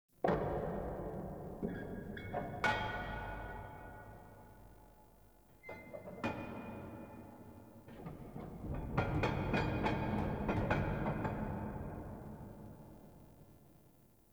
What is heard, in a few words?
Dungeon Island Cave Crumble, Ambience; Warframe; Duviri